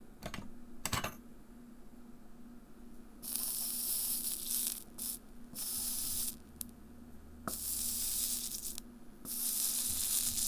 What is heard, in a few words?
etching paste melting